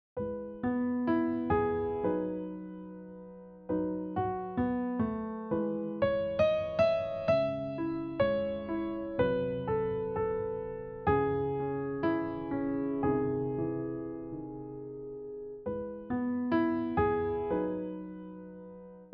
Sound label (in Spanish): Dos voces